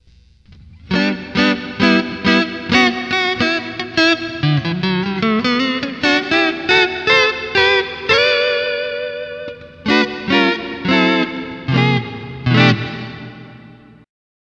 vibrato, Boss GT-8

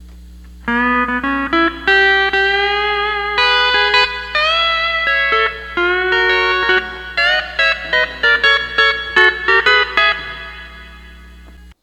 12 String Lap

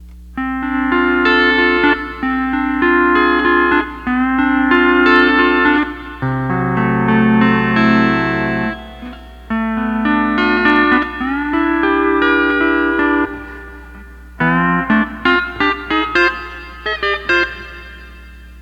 12 String Lap

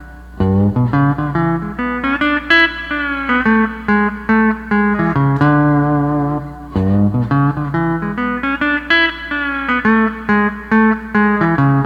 12 String Lap

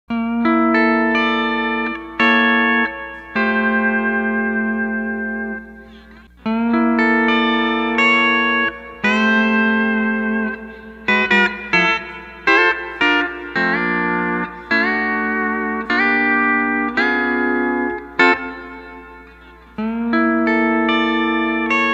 Add 9 Steel F Chorded

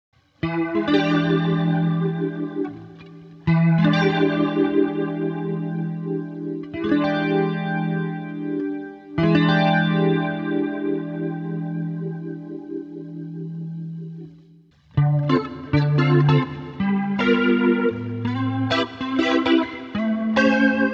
Guitar Rotary, AXE FX 3

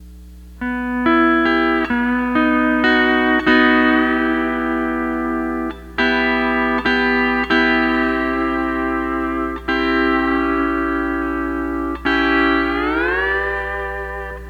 12 String Lap

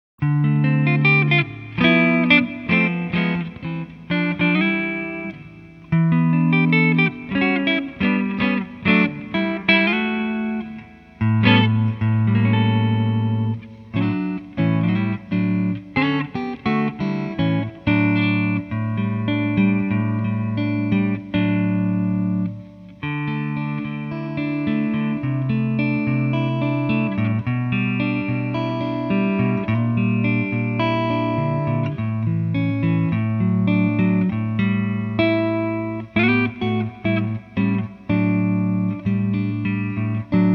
archtop electric, Silkyn Tube Amp

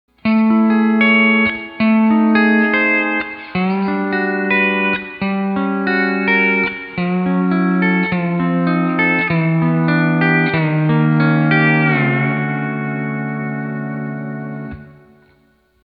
D13 Maj7 Min7 13b9 Chords; D13 Copedant Tabs

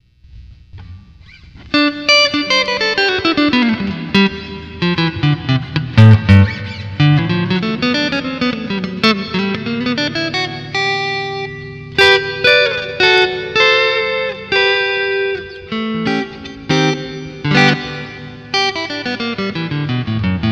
Reverb, Boss GT-8

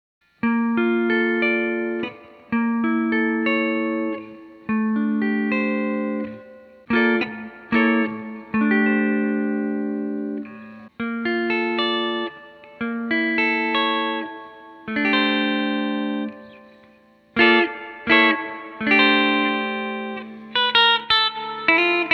Add 9 Guitar Maj 7

